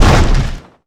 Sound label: touch, damage; DCS